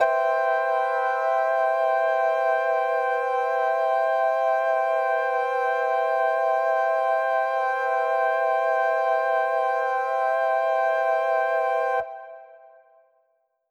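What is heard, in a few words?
Mixed Winds C major 7, synth orchestra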